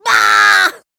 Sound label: Water Splasher; Rabbids Multiverse